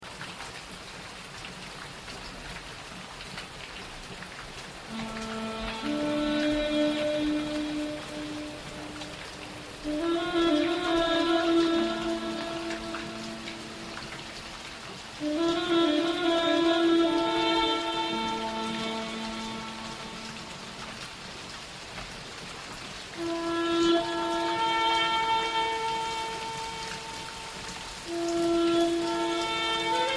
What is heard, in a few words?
pieces for sax